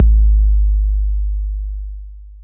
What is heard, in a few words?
BD 808 Noise